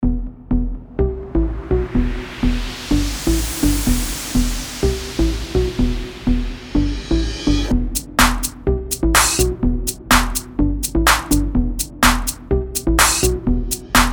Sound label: Deep House; eJay